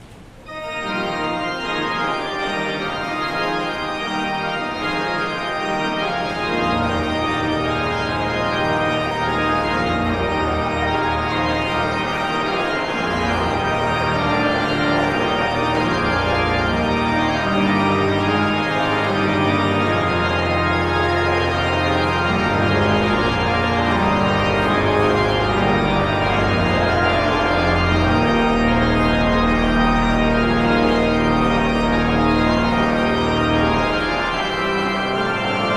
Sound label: Postlude